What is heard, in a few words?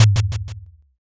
taiko